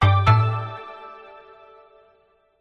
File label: multimedia game tone sudden event tone musical finish complete